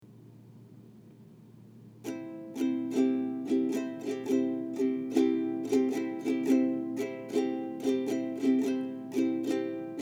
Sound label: ukulele